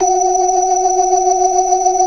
organ; Keith Emerson Signature Series

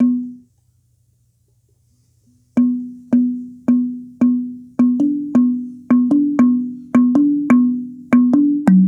Tongue Drum, African; Sonic Foundry (Sony Creative Software); Continental Drift Aftershocks

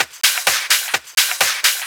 Discohouse Loop 128 BPM

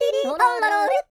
YODEE, World Voices; ILIO; Vocal Planet

